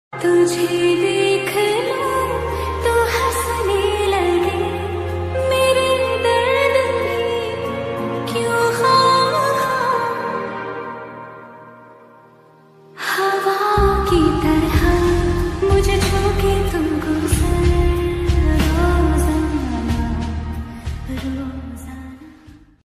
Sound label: Best Romaintic Ringtone Love Ringtone Song Ringtone Hindi Sad Ringtone